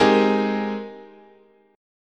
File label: G sharp Major 9th, chord